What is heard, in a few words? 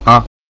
rat